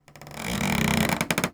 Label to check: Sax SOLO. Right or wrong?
Wrong